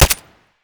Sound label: glock17 close silenced; Escape from Tarkov